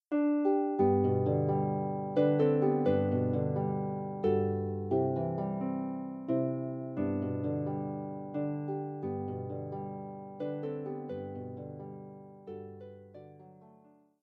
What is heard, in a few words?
amazing grace, Harp